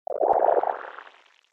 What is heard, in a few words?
tone